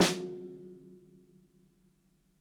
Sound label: ROOMY SNARE SOFT; Basement drums vol 2